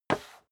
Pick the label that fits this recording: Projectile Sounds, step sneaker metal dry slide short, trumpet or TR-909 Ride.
step sneaker metal dry slide short